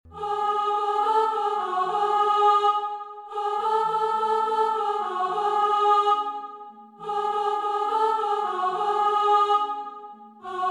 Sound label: Refugee Carol alto